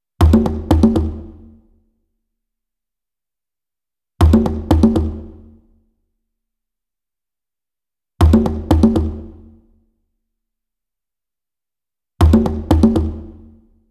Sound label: Congas Death March 120BPM; Ghosthack; Kit 01 - Death March; Percussion Loops